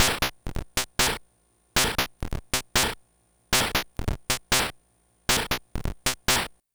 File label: LSDJ Wonkin' Loop 136BpM, Nintendo Gameboy Loops; maurice.rebell Loops